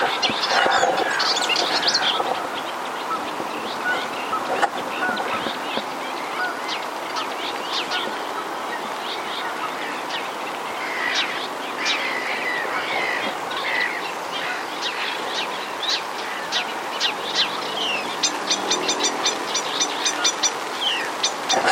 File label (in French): rousserolle effarvatte